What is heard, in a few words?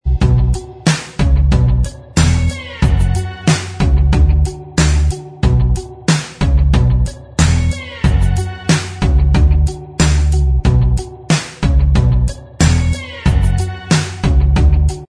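instrumental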